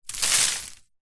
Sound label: wood chippings handful drop onto pile; foley; zapsplat